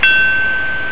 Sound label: ding; autoplot